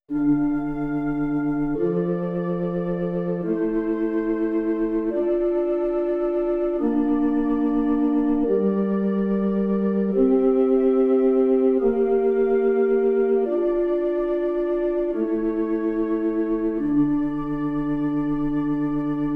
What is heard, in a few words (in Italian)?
Esercizi di contrappunto a tre voci; Erste Gattung; Ronge pagina 61